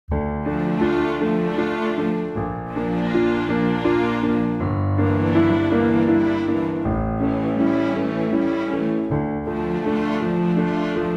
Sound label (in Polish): Serenada tempo docelowe